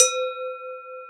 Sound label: Almglocken Hi ff; Digital Sound Factory Virtuoso